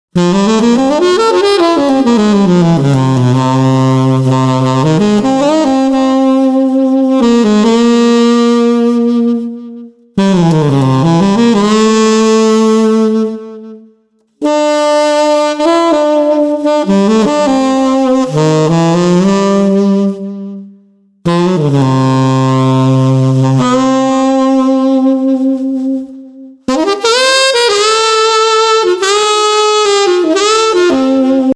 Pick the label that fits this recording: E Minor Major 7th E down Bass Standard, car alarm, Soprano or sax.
sax